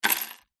household jewelry necklace silver charms set down wood floor; zapsplat